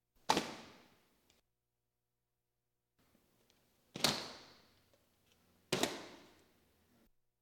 Police, Fire engines

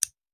Hand Gun Foley; Sound Ideas; Guns

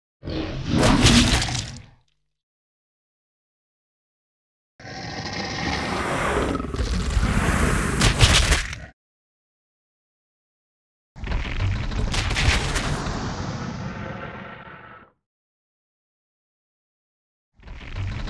Dragons Jaws Snap Shut; 4 Versions; Sound Ideas; Series 2000

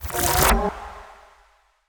Ice Spell Utility Levitation; Arctic Aura

